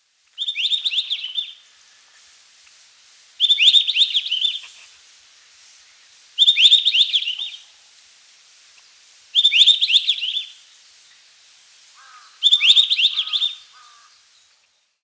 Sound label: white browed bush robin